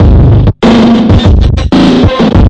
96 bpm garbage can crunchy munch, drum loops and breaks; 091 to 100 bpm